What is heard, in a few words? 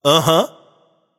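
nonverbal agree; smite; voicepack; merlin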